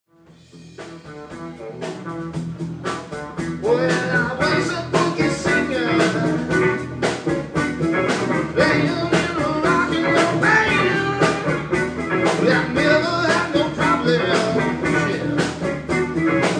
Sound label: Funky Music